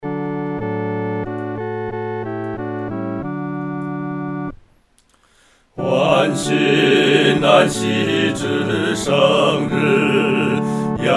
合唱 四声部

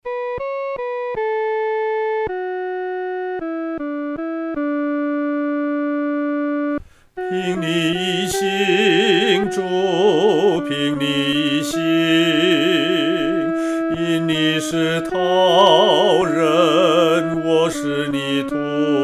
凭祢意行 独唱（第一声）

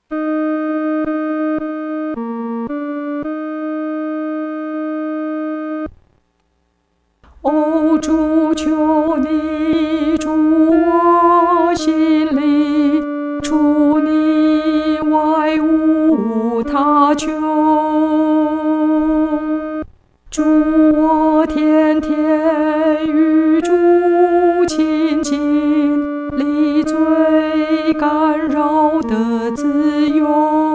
独唱 第二声

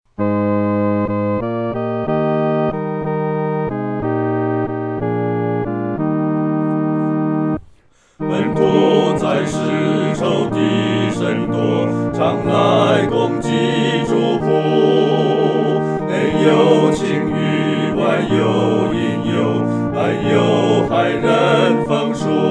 合唱 四声部; 靠信心得胜

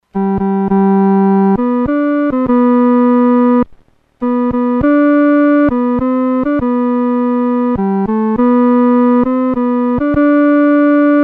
独奏 第三声

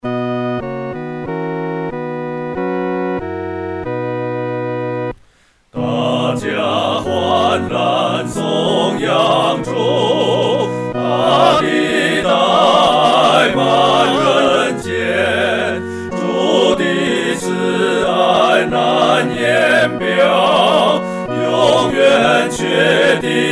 合唱 四声部; 欢然颂主

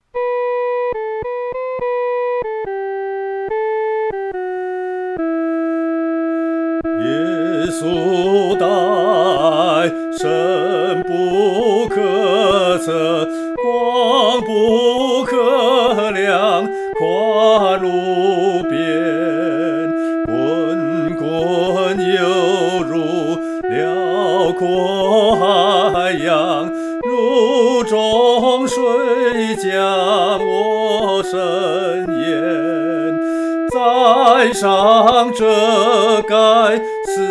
独唱 第一声

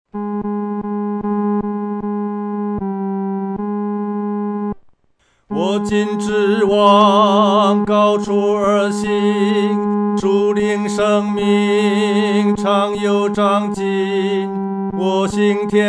独唱 第三声

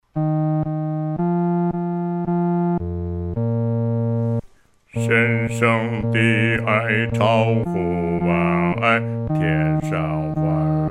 独唱 第四声

